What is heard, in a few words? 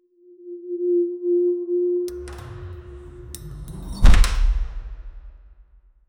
ethereal gate opening; mystical